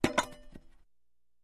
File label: Axe Falls To Ground